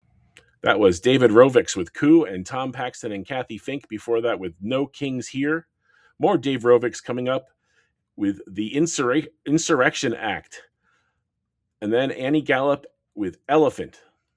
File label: Break